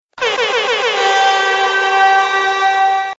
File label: Dancehall Riddim